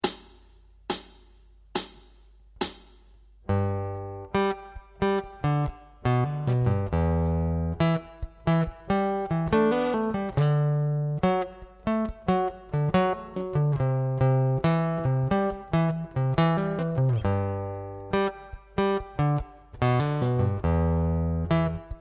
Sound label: Rhythm Riff